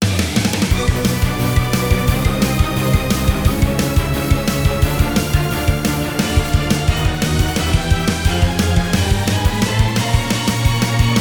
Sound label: BGM Gameplay; Everybody 1-2-Switch!